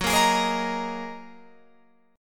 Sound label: Gb 6th Add 9th Gb down Guitar Open F; Listen to Gb6add9 strummed